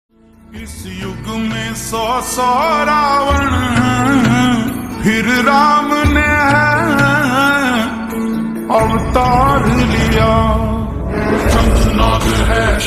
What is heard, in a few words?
Slowed Reverb Ringtone